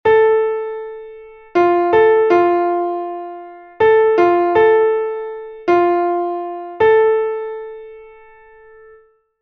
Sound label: the major third; Essentials of Music Theory: Elementary